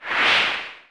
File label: pose; crogrey